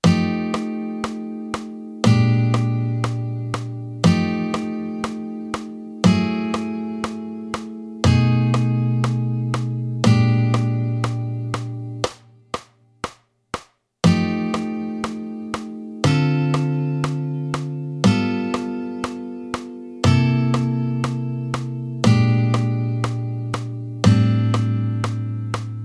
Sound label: Shell Voicing, jazz guitar; jazz guitar harmony shell voicing